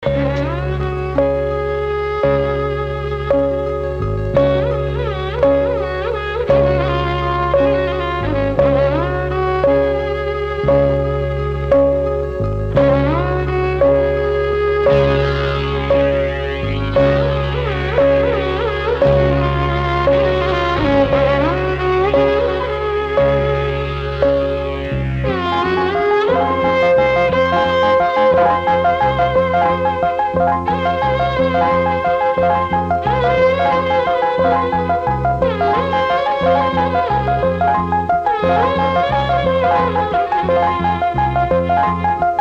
Bgm, Violin Bits; Telugu; Amavasya Chandrudu (1981)

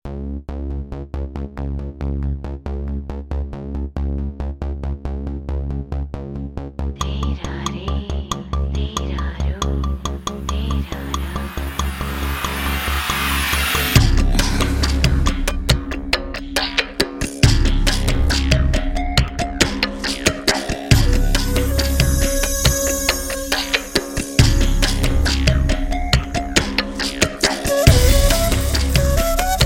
Romantic Beats; Telugu; Punnami Rathri (2012)

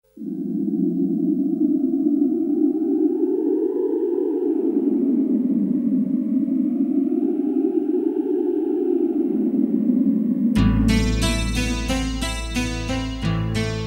Guitar Theme (Emotional); Telugu; Mahesh Babu; Yuvaraju - (2000)

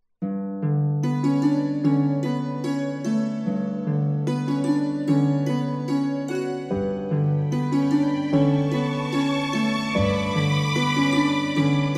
Instrumental; Dr. Arpana; Tamil; Uttama Villain (2015)

